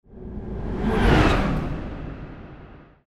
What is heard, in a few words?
swoosh flyby